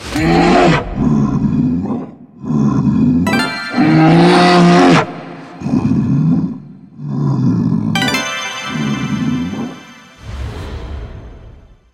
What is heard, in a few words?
super win; In The Forest; Wazdan